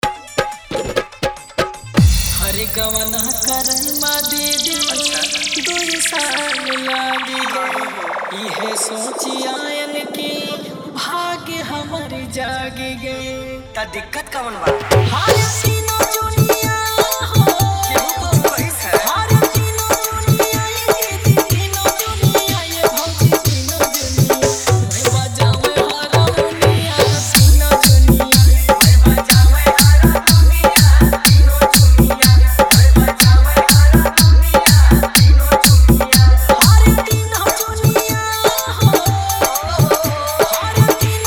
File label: Hard Dhollki Mix